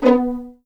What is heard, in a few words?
B3 PIZ F, VLNS PIZZ; East West; The Ultimate String Collection